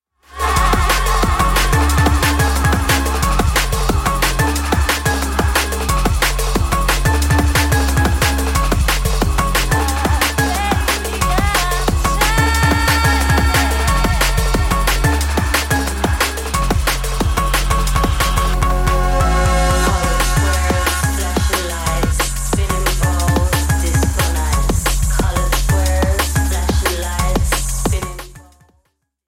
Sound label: xylo